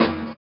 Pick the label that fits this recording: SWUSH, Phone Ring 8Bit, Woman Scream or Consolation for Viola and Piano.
SWUSH